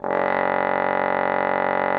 F#1, TROMBONE; Xsample VOL-3

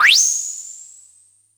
noise fx; Masterbits; Switch FX

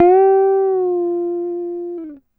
GTR TRIK, Jazzistic; Univers Sons

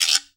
GUIRO UP, Latin Hand Perc; E-MU Producer Series Vol. 2; More Studio Essentials; Composer

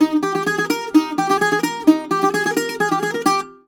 130, BOUZRA130, Mediteranean Traditions; USB Soundscan vol.53; AKAI

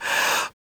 FEM PERC, Vocal Jazz FX

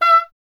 E. Horn; Miroslav Vitous; Solo Instruments VOL-2; Solo lnst. II

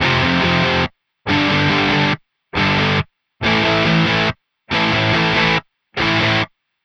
Distorted Guitar 140BPM Amin; Distorted Guitars; Vengeance - Essential Clubsounds Vol.4; Guitarloops

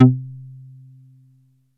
Bass C3, Prophet; ShortBass2; Oldies

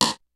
kicks snare, kid; Kid Nepro; Elite; KICKS SNARES

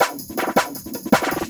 Loop, Drum & Bass, 160 BPM

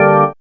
organ, c, Garage Organ; Dance Synths

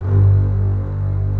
grand piano & strings; OMI - Universe of Sounds; EII Factory Library

